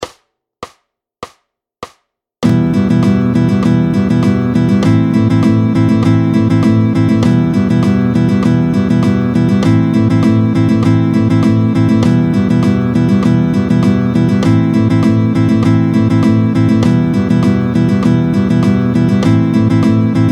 Vite Croche doubles croches, 1 croche – 2 doubles croches. Vite, battement

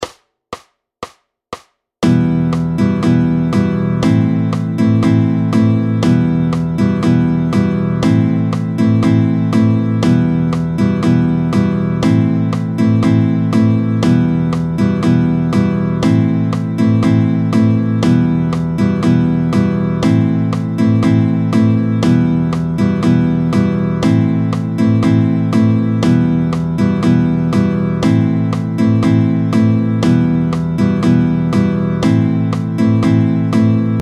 Vite Noires pointees 120, Les noires pointées. Vite, tempo 120

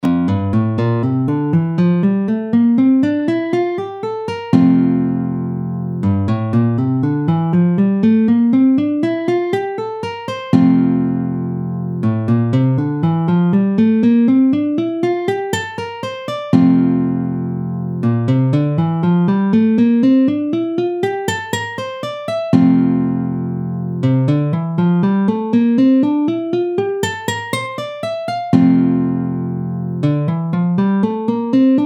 La gamme heptatonique doigtes

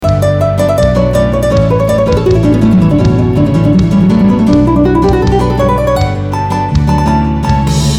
Guitare chorus, Guitare chorus dans l’arrangement; guitare acoustique; chorus (solo)

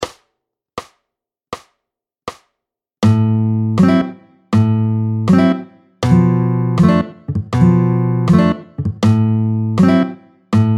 Pompes 2 tempo 80; 16-07 La pompe 2, tempo 80; La pompe rapide tempo 80; manouche

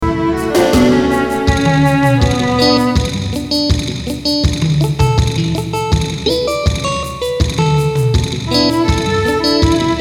Clear guitar Stratocaster cocottes, « Cocottes » de la Strat dans l’arrangement; joue une rythmique sur 3 mesures en « cocotes » (mesures 204 à 207)

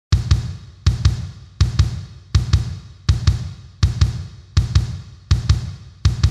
Bass drum, La Bass drum isolée; Bass Drum (BD), grosse caisse.

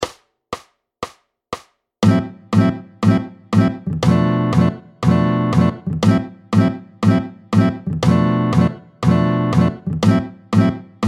Pompes Vite tempo 120; 16-06 La pompe. Vite, tempo 120

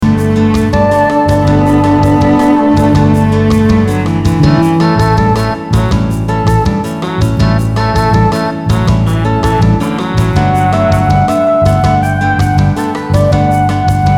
Sax soprane, Le sax soprane dans l’arrangement; saxophone soprane; Il démarre à la mesure 25.; Le saxophone soprane entre en mesure 28.

